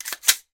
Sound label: Glock Cocking